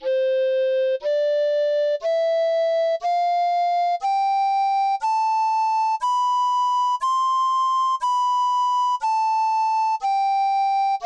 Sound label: Scales, alto recorder